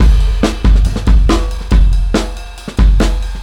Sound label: Shake Beat; KJ Sawka; Drum n Bass & Beyond